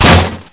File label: vent drop, metal; hl2; impact